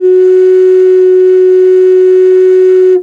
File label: FLUTE NOTES, Primitive Instruments; Didgeridoo and Other Primitive Instruments